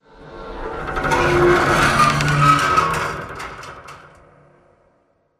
metal stress med; Titanfall 2

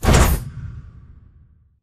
sliding door ext verb open stop; Titanfall 2

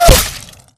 bullet light ballistic kill shot human; Titanfall 2

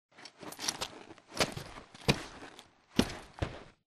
left grunt mvmt; Titanfall 2

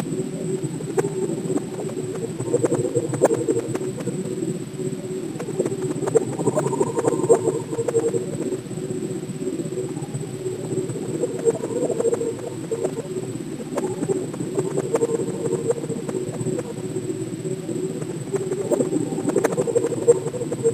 digital distortion; Titanfall 2

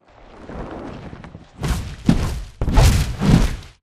flyer perched takeoff close; boneyard; Titanfall 2

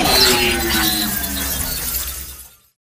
Reboot Power Down; AI Stalker; Titanfall 2

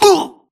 grunt death neck; Titanfall 2

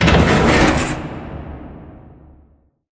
pod door lg verb open slide; Titanfall 2

